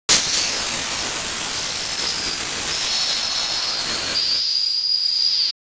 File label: Chronic Hit; Weird; Industrial